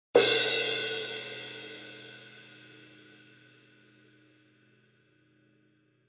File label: drum clash none